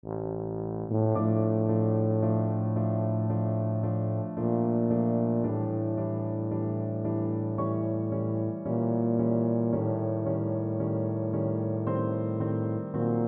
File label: chopin e min, tuba